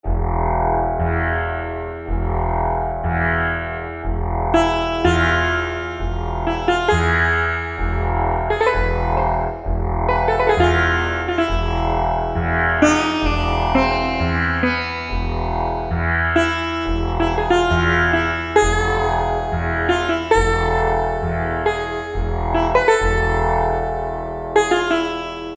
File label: syn Indian Garden